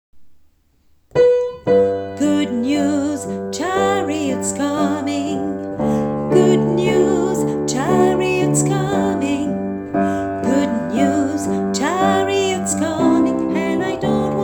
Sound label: alto